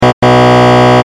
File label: failing haha